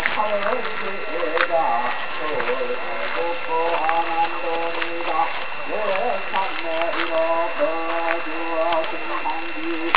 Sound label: river cruise Buddhist monk chanting; Korea; Seoul